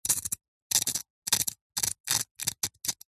foley stone rock carve engrave scratch with small sharp metal spike